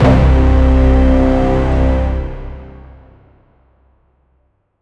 the most heave dramatic h, bass

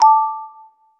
messaging app notificatio; apps

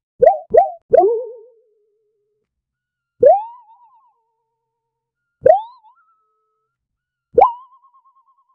soft and funny boing sound

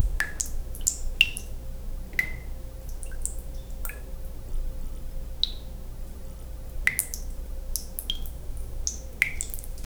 water dropping from the, cave

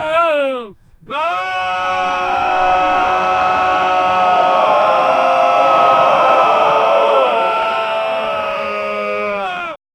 a group of adult male, viking

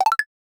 a ui upgrade sound effect

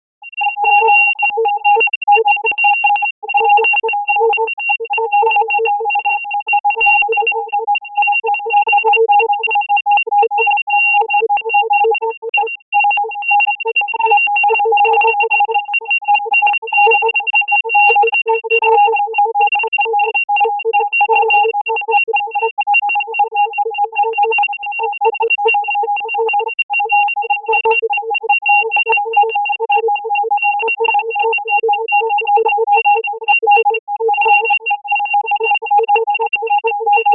small robot desperately; humanoid

